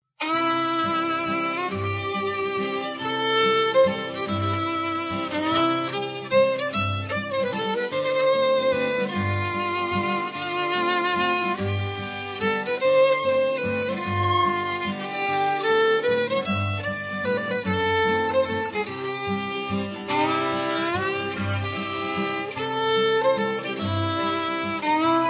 Waltz

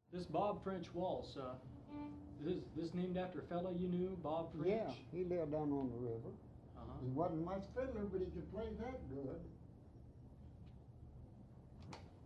waltz

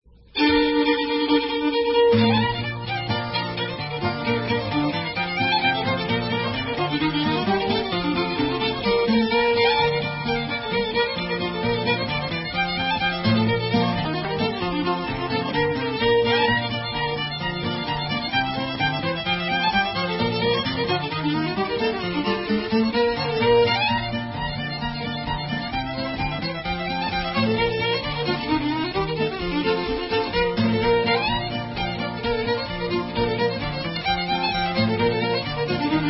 Hornpipe

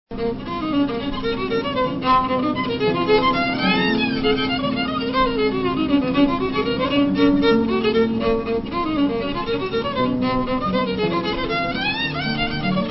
Hornpipe

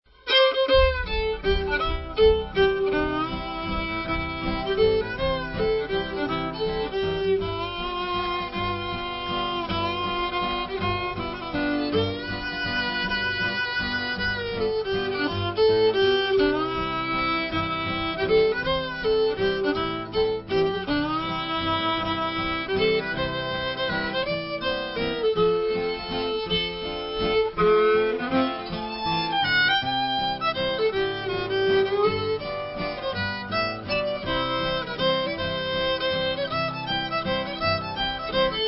Shoe Cobbler Blues

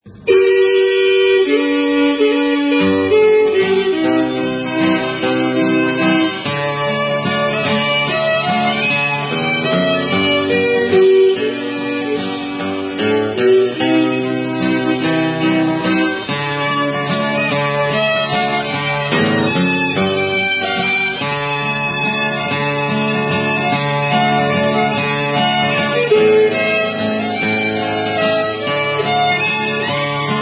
Waltz

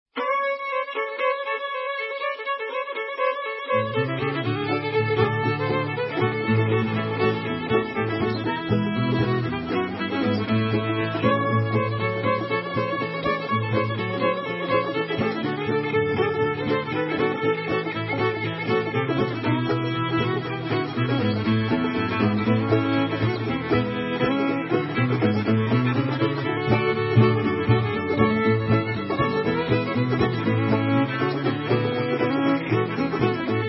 Blues

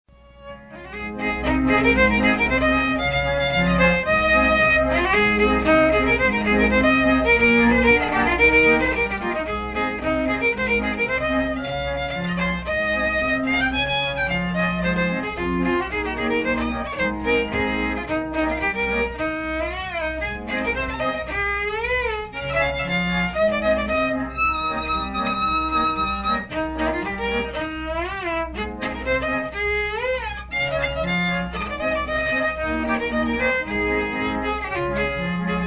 Trombone

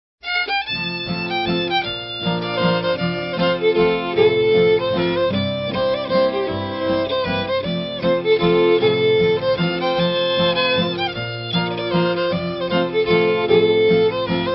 Waltz

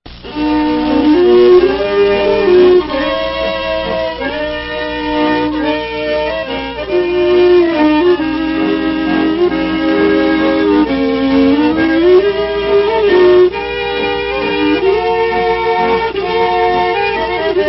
Spanish Waltz

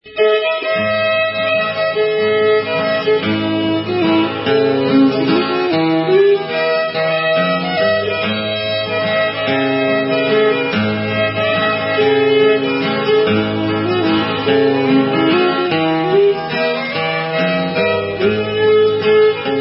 Waltz

